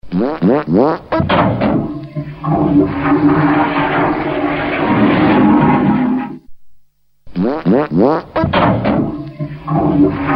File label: Jingle; Concept Radio; Merseyside